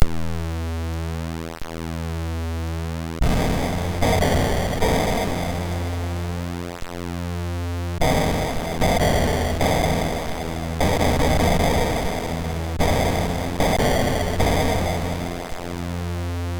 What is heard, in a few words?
8Bit Dungeon, MOS6581R3; hvsc